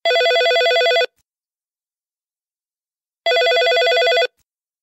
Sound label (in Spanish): ring llamada